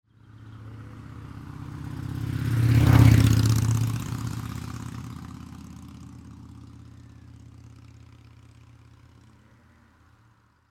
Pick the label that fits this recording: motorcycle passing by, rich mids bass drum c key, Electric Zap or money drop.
motorcycle passing by